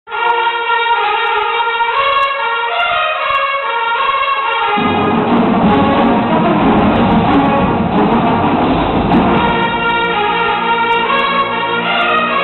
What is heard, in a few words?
Marching; Michigan